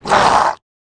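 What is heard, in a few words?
Npc Cyclops Damage; FlyForInsanity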